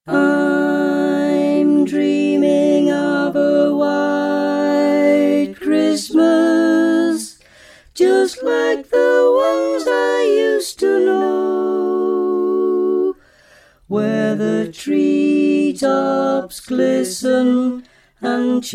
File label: Mezzo; Choir Harmonix; Harmonies; Carols